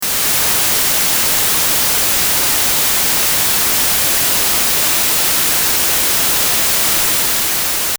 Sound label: White Noise